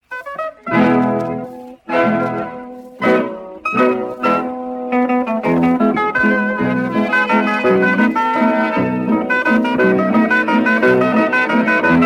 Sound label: Waltz Cow Row, Suisse